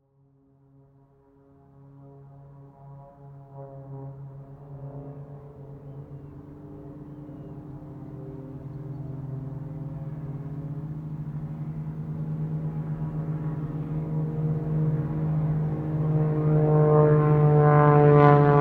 aircraft prop planes x4 unknown type acrobatics air show